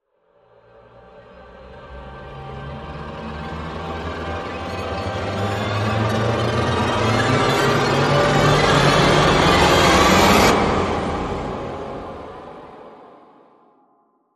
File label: Witches Coven Rise